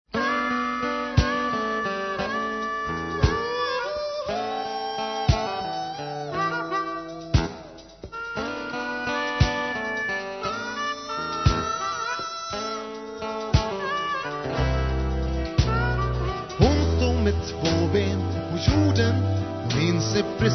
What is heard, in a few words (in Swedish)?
blues munspelsintro